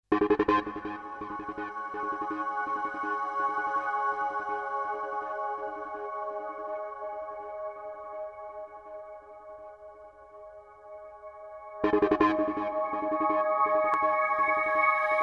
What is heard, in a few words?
drum bass version